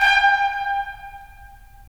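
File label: brass trumpets staccato; Roland LA Composer vol. 4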